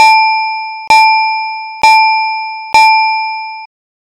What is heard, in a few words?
bell rings short